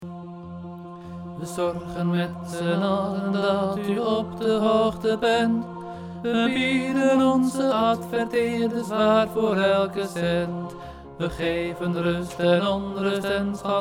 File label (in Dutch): sop 1 en 2